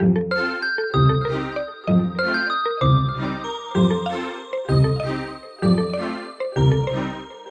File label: music strings; Bejeweled3